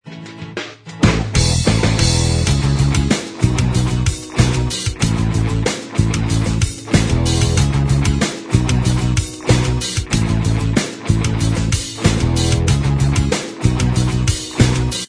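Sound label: instrumental, track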